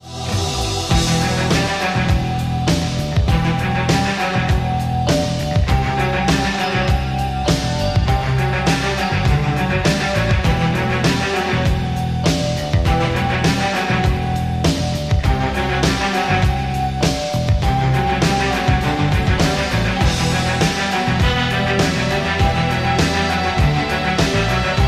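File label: night melody electric guitar solo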